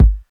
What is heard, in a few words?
deep steel kick drum sample g sharp key